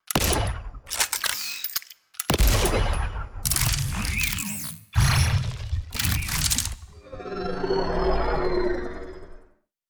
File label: Sci-Fi; jmksounddesign